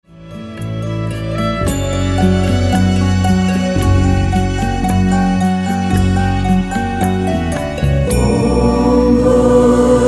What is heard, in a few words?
Gayatri Mantra, Cosmic Kirtan